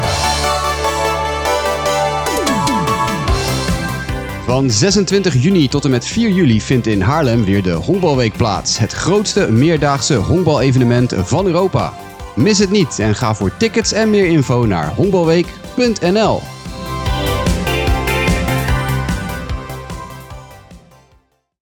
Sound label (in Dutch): Honkbalweek Hoofdklasse Jingle